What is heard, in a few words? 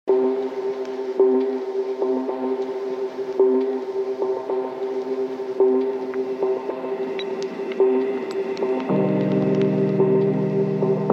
instrumental